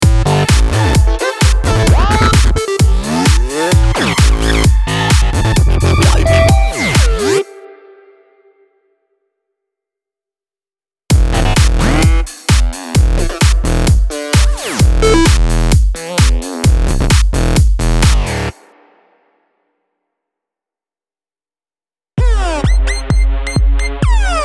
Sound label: Electro Template